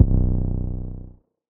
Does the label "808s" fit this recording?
Yes